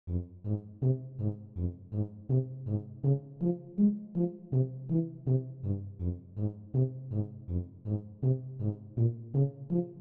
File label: Euphonium